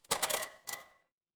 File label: office cash register